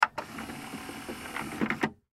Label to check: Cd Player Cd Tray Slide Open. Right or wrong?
Right